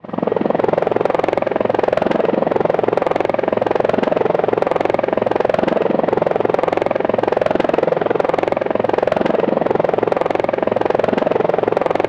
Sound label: helicopter hovering; transportation